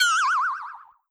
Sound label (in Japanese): kiga nukeru